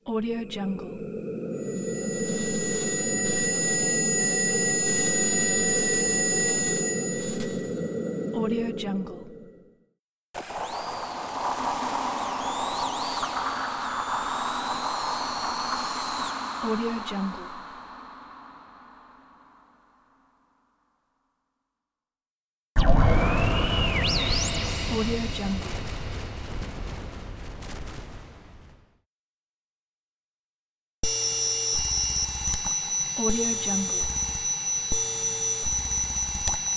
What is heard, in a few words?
dark scifi transition pack